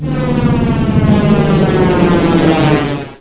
alien fly by

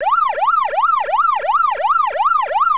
car siren

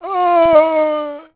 zombie die, male

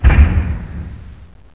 lights on

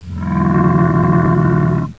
die

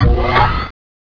jump start; gunner

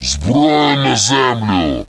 attack, troll

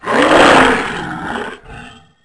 die, zombie; Crimson Head

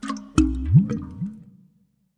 water cooler

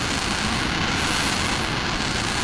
flare burn

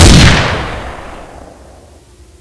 sniper

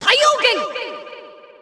solar flare

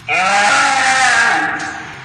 aah

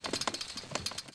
wheelchair move

